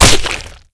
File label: knife hit flesh